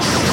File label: Ship Weapon; Trek